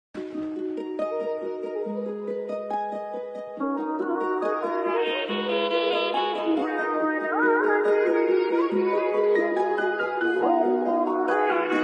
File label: kurdish drill